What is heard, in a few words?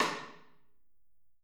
SN, Drums Percussion; CMB Kit 1